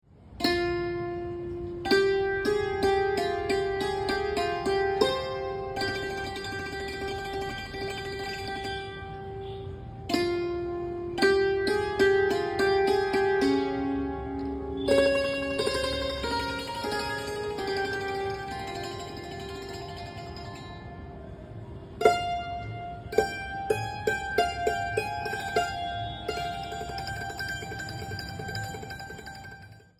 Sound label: guitar ringtone